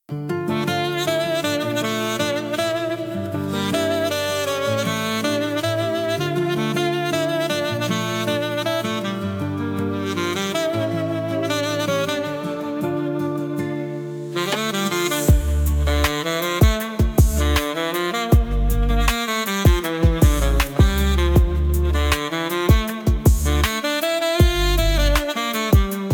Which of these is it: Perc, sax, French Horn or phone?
sax